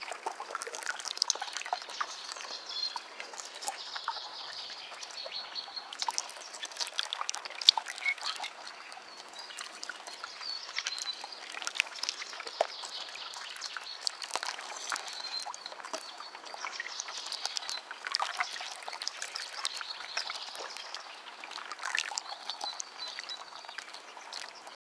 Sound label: water splashes and chirps, bird chirps